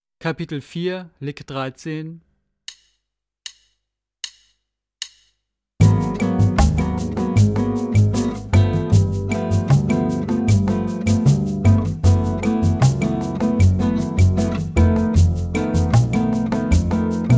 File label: Latin Style Guitar